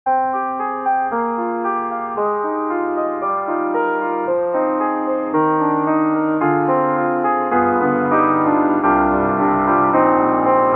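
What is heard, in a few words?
Drifting Keys, piano; Soniccouture; The Hammersmith Professional Edition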